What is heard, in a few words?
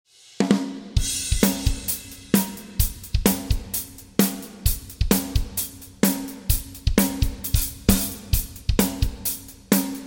Drums; Alitverb; The Great Pyramid of Giza